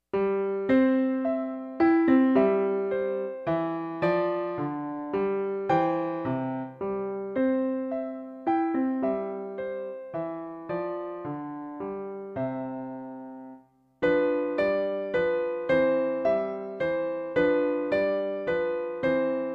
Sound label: german folksong